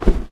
bird flap

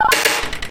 button; buttons; source engine; hl1; video games

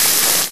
burn pain no vo

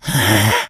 become alert, infected

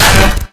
melee guitar; weapons; source engine; left4dead2

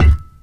physics cube impact soft

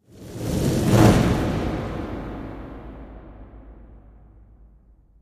fireball flyby; video game; source engine; portal2

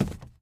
phys cube, physics

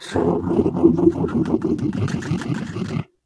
ballbot impatient

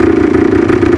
giant scout loop; source engine; tf; mvm; video games

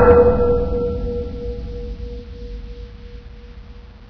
warning bell, alarms; ambient; source engine; hl2

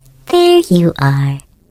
turret active; video games; npc; source engine; portal; turret floor

